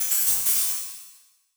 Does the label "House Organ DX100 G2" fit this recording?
No